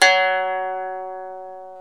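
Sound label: ethnic plucks; Eth Plucks, Pluck Duo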